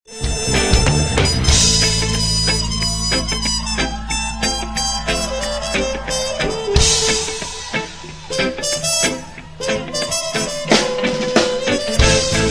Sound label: smooth jazz trumpet